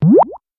skill shooting bubble; agar agar

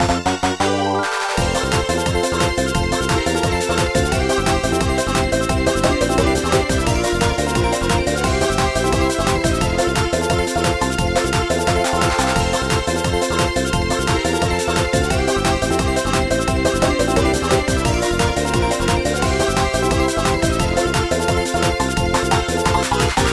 Bgm bonustime day common faster

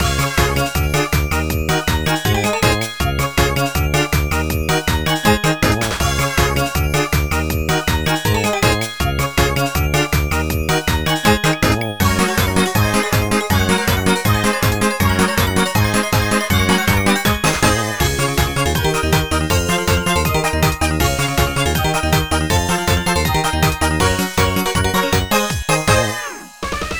bonus crystal

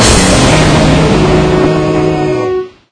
Bgm Jingle

